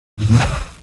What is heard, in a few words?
Water Elemental Attack Sound